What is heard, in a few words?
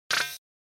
Error noise